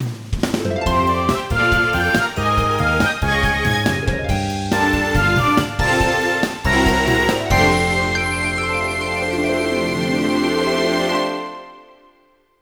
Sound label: Jingle Start